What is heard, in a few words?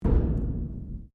Strike Metal Hollow Tank Soft